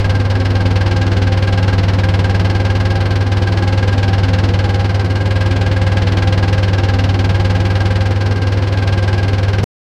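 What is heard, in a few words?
heavy diesel generator idle, low rpm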